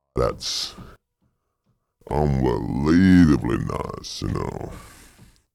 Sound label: Original Part, 113.58